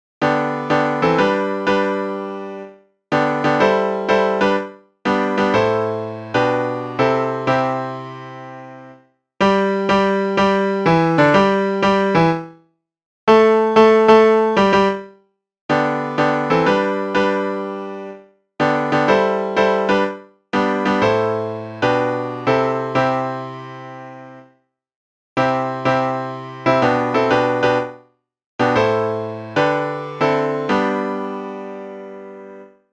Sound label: tutti